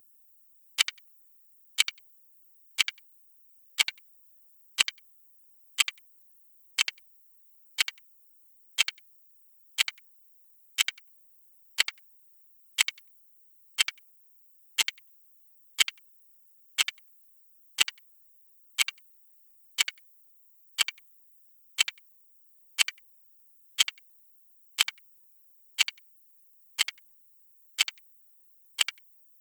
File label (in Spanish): tictac de reloj de bomba; timer